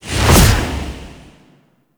Sonic Dissipation Cast; Warframe; Focus; Vazarin

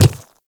Infested Footsteps Quadruped Medium Walk Default; Warframe

